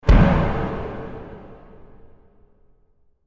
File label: Oil Drum Explosion Impact Concussion Metallic, Lid Blown Off; Explosions; Blast